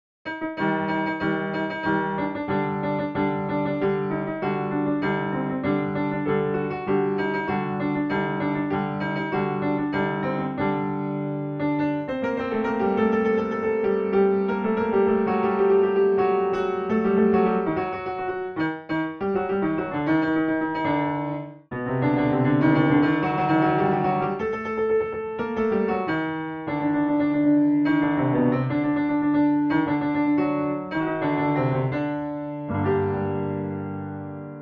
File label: Homayun, piano; Naghmeh; Zange-e shotor; Radif Mahjoubi